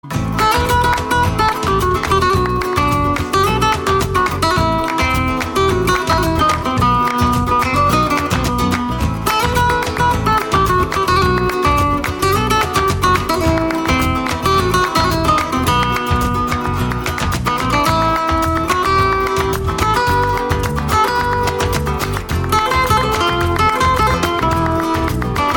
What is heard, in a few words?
Rumba